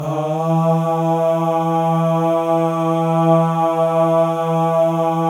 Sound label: MALE AHS, Symphony of Voices; Spectrasonics